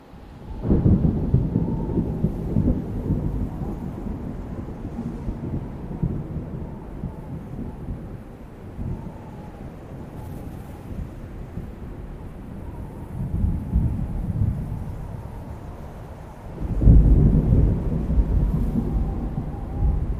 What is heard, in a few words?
ambient